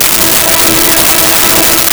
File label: Strings E4; Nintendo; July 2020 Gigaleak